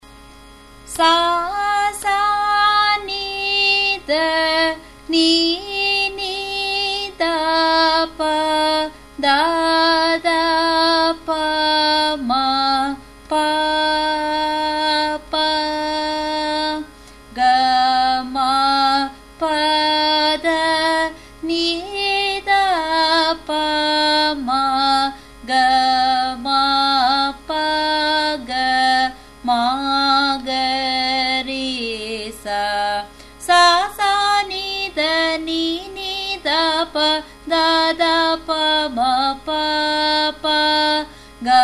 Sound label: Sarali Varise G sharp, music; Carnatic Music